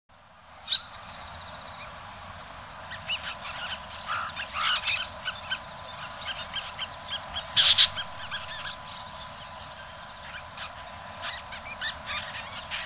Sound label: Sterna hirundo; Sakhalin; Kuril; sea; priroda sakhalina i kuril